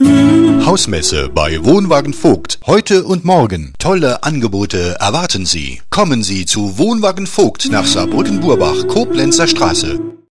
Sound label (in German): Spot mit Gesang v. h. Musik